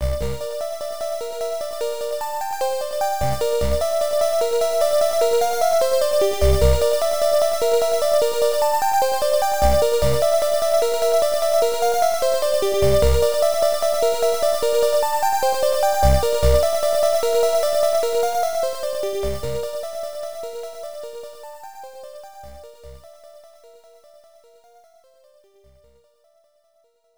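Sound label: Stage BGM, TurboGrafx-16; galaga 88; Scroll Stage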